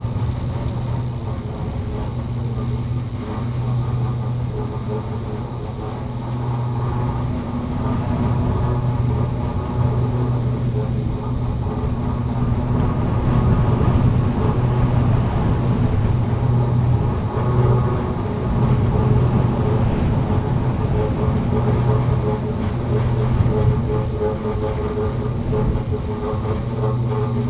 industrial electricity current going through post pylon desert; felix blume